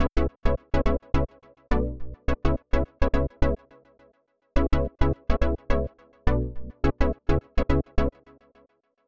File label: electro funky stab E